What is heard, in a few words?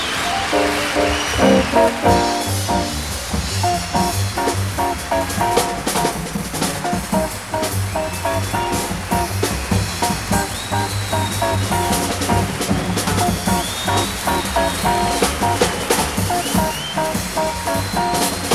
fanfare